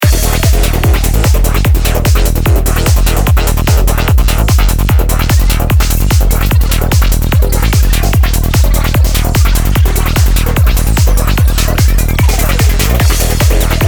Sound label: confused and distorted